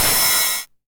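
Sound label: 909 ride tape saturation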